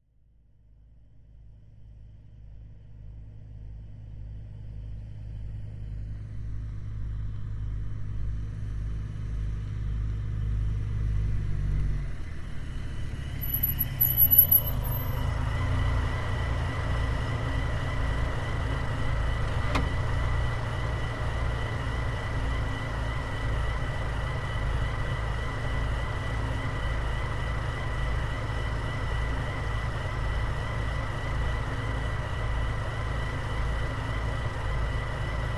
Dodge Ram: Slow In, Idle And Off, With Brake Squeaks.